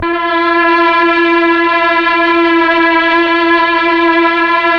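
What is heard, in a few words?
Mellotron Strings F4, Slow Strings; Keyboards of The 60's and 70's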